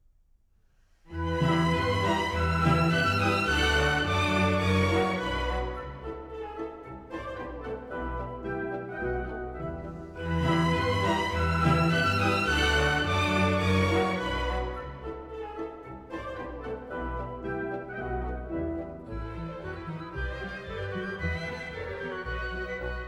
Hungarian Dance in E minor; Brahms Violin Concerto & Hungarian Dances

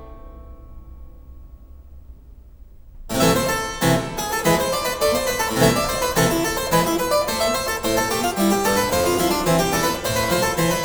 Harpsichord; Blandine Verlet; Bach; Complete Partitas for Harpsichord; 巴赫羽管键琴组曲全集

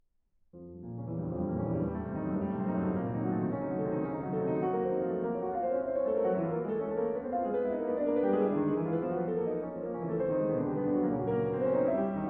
Sonata in B flat minor Presto, Piano Sonatas; Chopin

